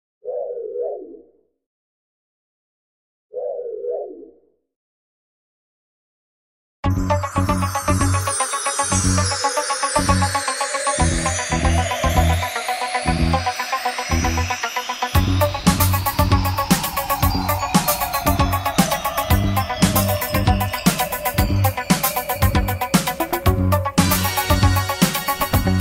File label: Synth Guitar Cover; Knight Rider; RetroDeck